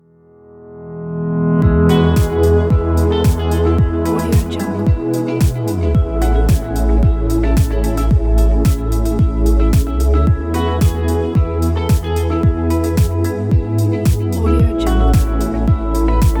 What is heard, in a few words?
corporate uplifting optimistic advertising inspiring